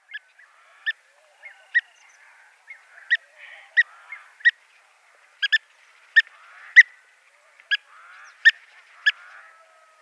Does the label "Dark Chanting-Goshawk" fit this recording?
Yes